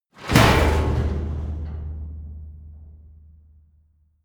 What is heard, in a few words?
metal container impact large single